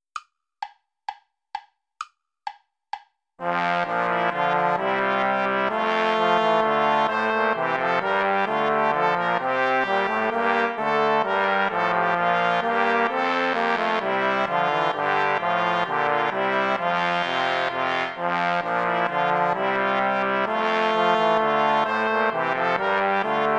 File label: ballet play along without trumpet; praetorius michael; ballet des feus